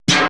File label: 80S DRUM SET